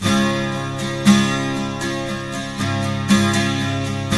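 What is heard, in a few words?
12 string major D, Guitars; Spectrasonics; Hans Zimmer Guitars VOL-1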